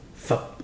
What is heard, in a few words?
pluck poultry feathers; ibibio